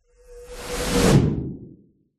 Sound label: magic spell whoosh powerful energy pass electric